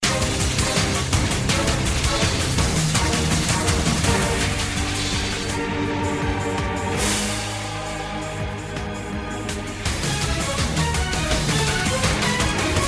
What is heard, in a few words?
Battle Music; Zak Storm Super Pirate; mobile